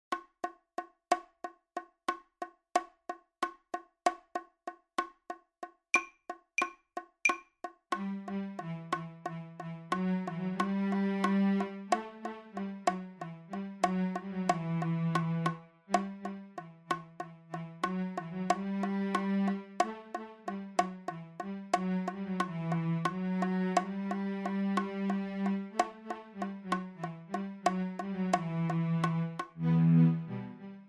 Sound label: Cello Solo